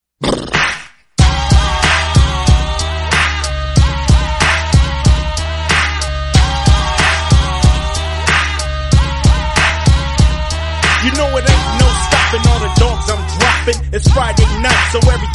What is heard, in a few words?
awesome hip hop musical tone ringtone; iphone ringtones